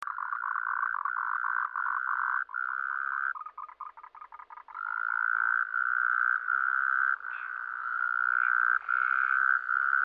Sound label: Rhacophorus taipeianus, tree frog of Taiwan; 台北樹蛙